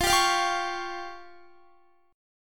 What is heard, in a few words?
F Suspended 2nd Sharp 5th F down Guitar; Fsus2#5; strummed